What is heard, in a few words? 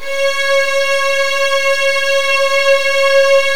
C#2, Virtuoso Strings